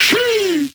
Vocal Adlib Male "Shee"; Bass House Sample Pack; Dropgun Samples

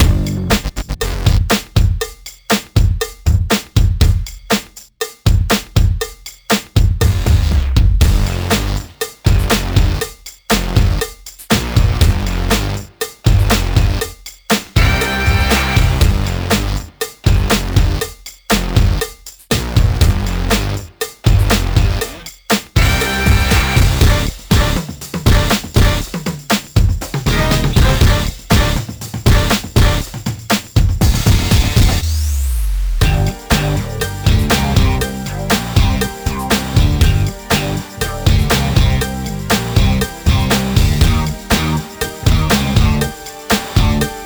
Instrumental Snippet

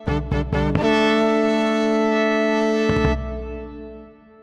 Winjingle Small victory

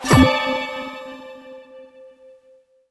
New League; uno

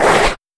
wall slide bonus

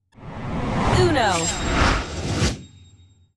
SFX - Menu Intro Soundtrack; uno

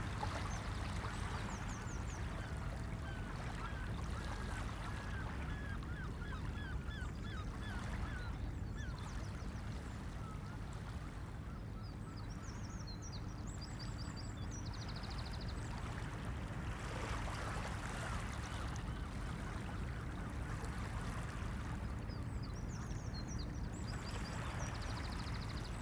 Ambience Beach

